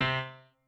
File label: piano